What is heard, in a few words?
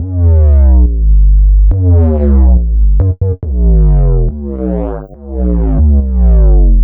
140 B BASSLINE, Dubstep; Loopmasters; The New Sound Of Dubstep; BASS LOOPS